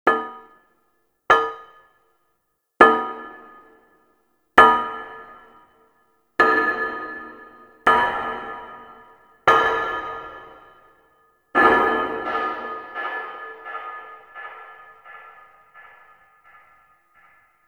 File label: Destroyed Class Window Tap; Cinematics; Void Kid Intro; Warframe; TheNewWar; Zariman